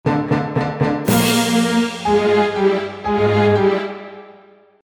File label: orch sting; trogdor